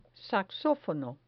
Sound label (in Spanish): saxofono